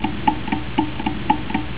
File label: tick